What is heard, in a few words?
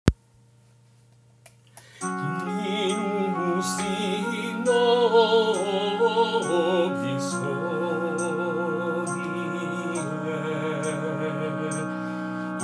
Soprano